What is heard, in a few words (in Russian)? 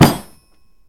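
удар деревянным молотом по металлу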